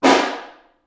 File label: Snare Far; Ableton Foundation FreePacks; Snare Designer